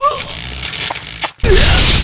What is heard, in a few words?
mob raid die; 야투공야손